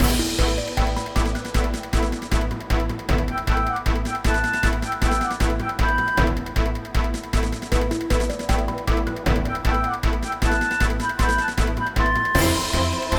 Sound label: cargoship battle main; crosscode